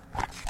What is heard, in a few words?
Button Press